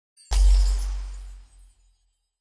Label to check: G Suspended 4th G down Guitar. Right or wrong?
Wrong